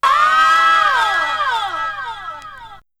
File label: female vocal